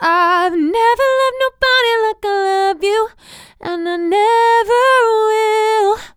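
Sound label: Ive never loved nobody like I love you F Major 75 BPM, Vocals Phrases; GhostHack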